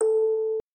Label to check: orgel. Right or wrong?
Right